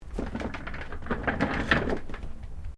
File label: Chair office wheeled move; Office furniture; Offices